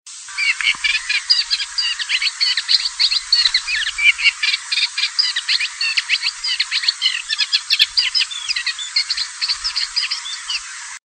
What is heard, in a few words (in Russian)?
kamyshovka trostnikovaja